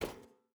footstep barefoot metal walk; Rust

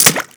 bullet water; weapons; Modern Warfare

